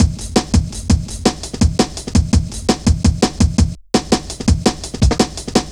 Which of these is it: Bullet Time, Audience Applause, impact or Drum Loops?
Drum Loops